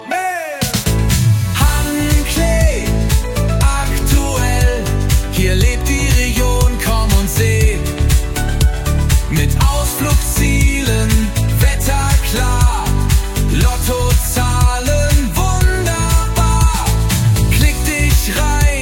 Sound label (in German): SONG JINGEL; KURZE VERSION; sehusa wasserwelt seesen